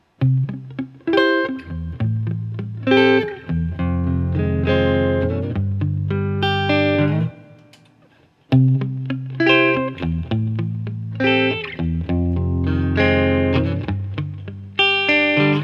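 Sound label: Guild X500T, guitar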